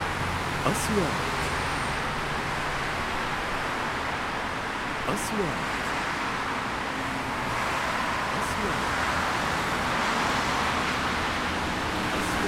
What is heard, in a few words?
Cars on a general road